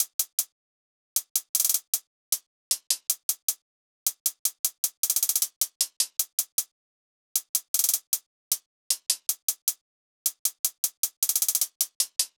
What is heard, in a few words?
Drum Loop, 155 BPM Hihat, Trap; Loop Stems; Ambush